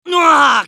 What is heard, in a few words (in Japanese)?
男･ダウン; マスケッティア